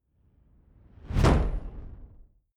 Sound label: rising wood; whooshes rises